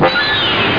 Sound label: WeaahHH